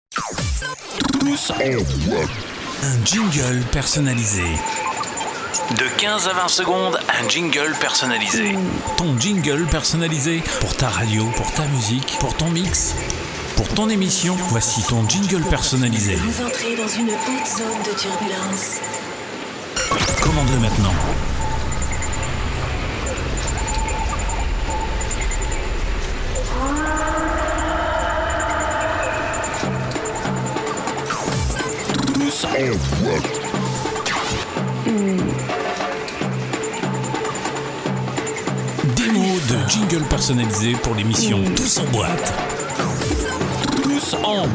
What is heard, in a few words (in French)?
jingle personnalise, jingle radio musique dejay